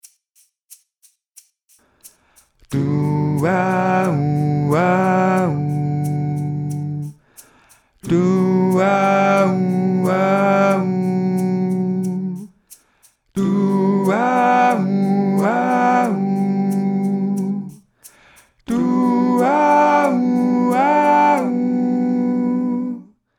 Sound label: Duauau Maj7 Mezzo